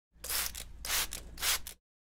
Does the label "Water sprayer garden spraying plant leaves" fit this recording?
Yes